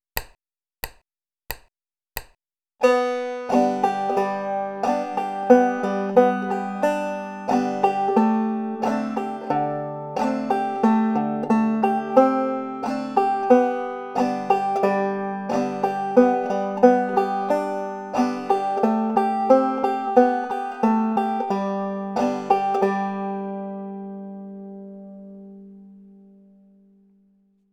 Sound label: drop thumbing